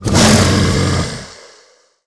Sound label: worm striking, giant worm